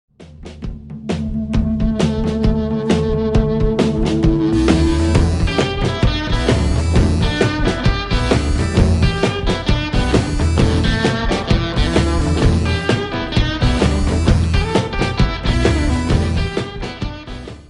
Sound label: endround; cstrike; DEX